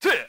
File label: kick; RedDot